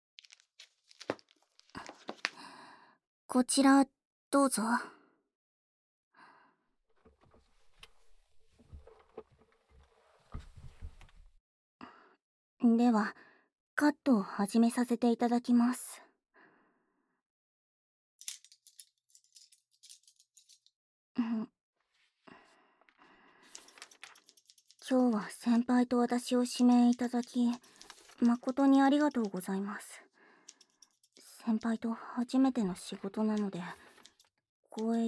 ヘアカット

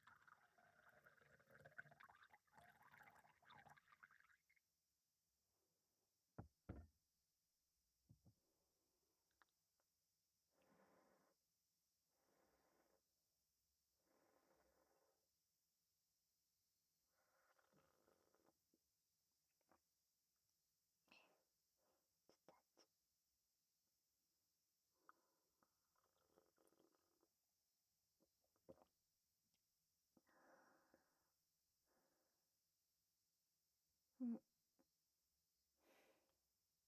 癒しの耳かき

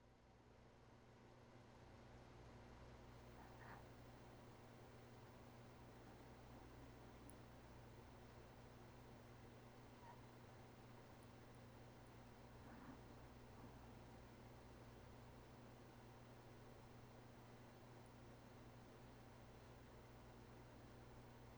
あさひの寝息ループ; ASMR

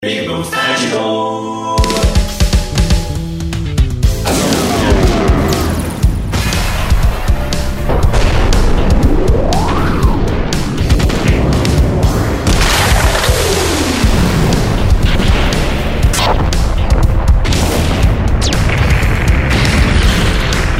爆発; ASMR; 効果音; ぴぐみょん効果音素材集; ぴぐみょんスタジオ

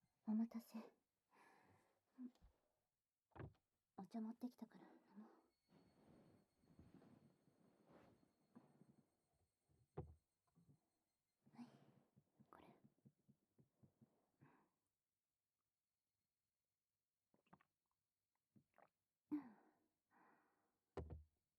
ご褒美マッサージ, ASMR; 委員長のお家で

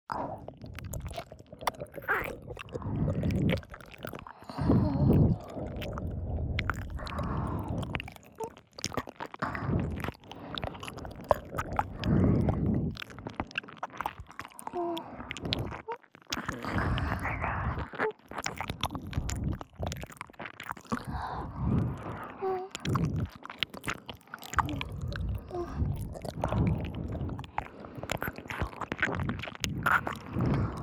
耳舐め; 反転

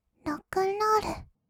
ロックンロール; 1200 kawaii voice!!

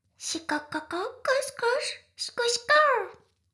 シコシコ音声; 魔法少女マスカリマッスル

